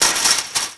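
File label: chain link; WhiteOut; XBOX; crater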